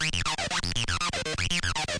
120 aggressive mono synth; SYNTH AND ELECTRONIC LOOPS

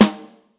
BRUSH SLAP, Roland TD 7; drum machines

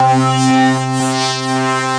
Synthesizer Middle C Notes, Lead

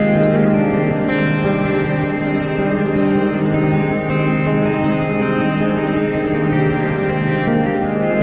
guitar super world atmosphere; GUITAR LOOPS

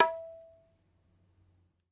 Ta, Tabla; ETHNIC and WORLD PERCUSSION; Tabla Kit Large

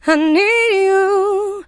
female i need you; VOCALS and SPOKEN WORD

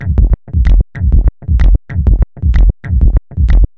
127 bpm deep and far away krunkno

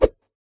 kung fu staff, swings

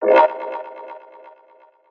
froggy echo bass, synth

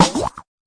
fx drum, Electro FX

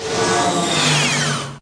Electro FX; Ueb HE Electro FX

